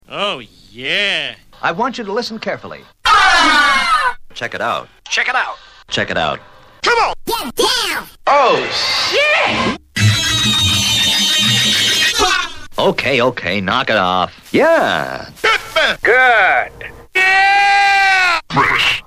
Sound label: break scratch